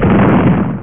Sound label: die; DOM invaders; classic javascript games